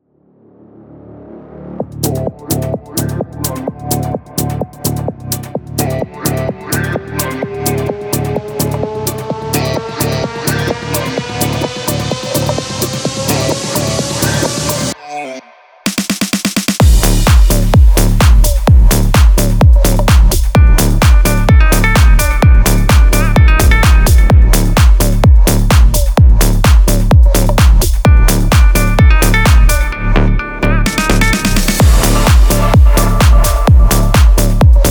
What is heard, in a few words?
7A 128 Progressive House Trance